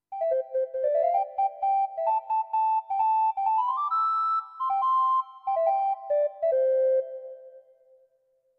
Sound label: Ocarina